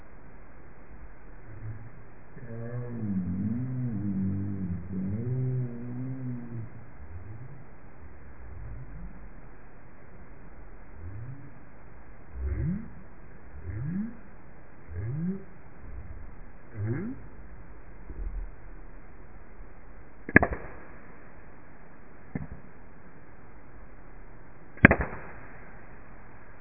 multisound right whale

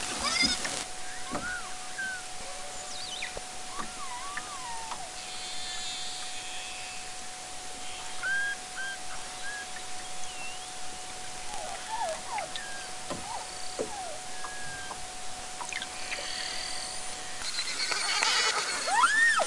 multisound beluga

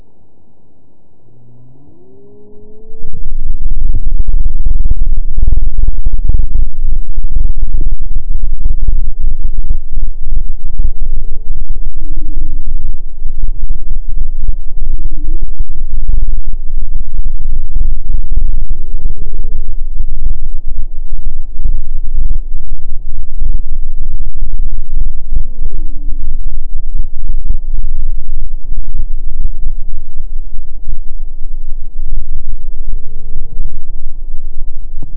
Quake amplified LPfilter200 earthquake

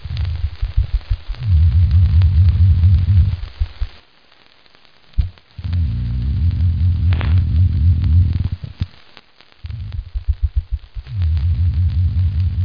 pulses amplified black grouper

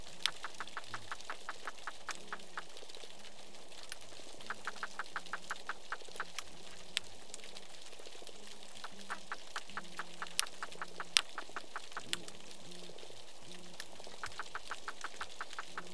knocks silver perch

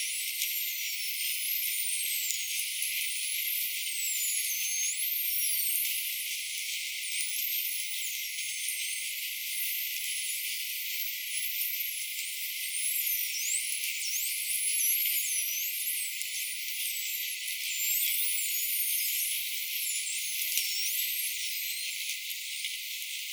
whistles short beaked common dolphin

